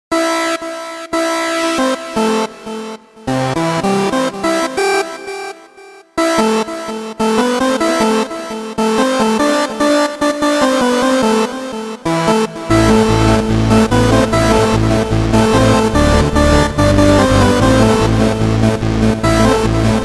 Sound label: Motif lead